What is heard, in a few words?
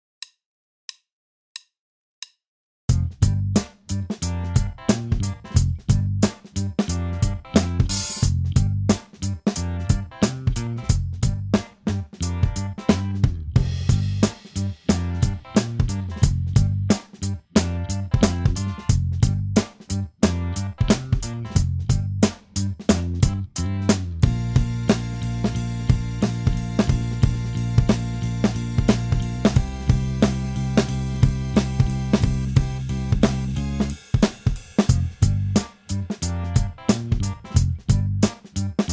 Funk